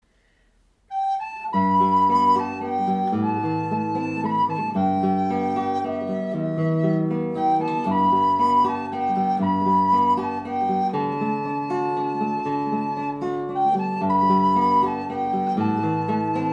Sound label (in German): Der Fiedler Gitarre, Blockfloete einstimmig ganz einfache Lieder